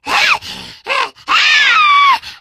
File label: spitter lurk, voice idle; source engine; left 4 dead 2